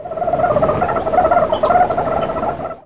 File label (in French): hermine